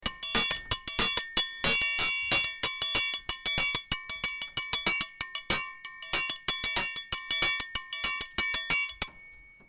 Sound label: Triangel